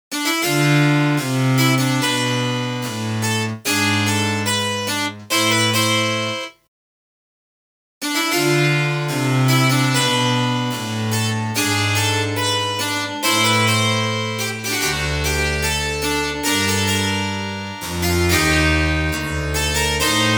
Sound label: Yamaha SPX90 Room Harpsichord